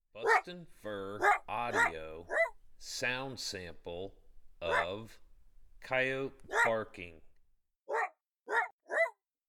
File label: Coyote Barking